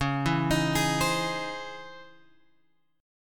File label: C sharp Minor Major 9th, guitar chord; C#mM9 Chord {9 7 X 8 9 8} arpeggiated